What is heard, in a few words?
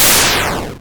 damage; ahoge20